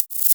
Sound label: Insect Trill